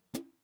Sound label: Snares & Claps, One Shots